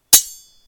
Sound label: sword clash; RPG Creation Kit; RPG Sounds Pack 4